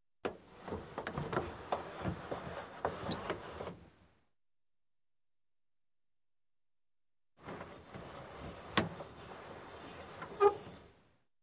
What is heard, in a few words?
Car glass lowered, raised manually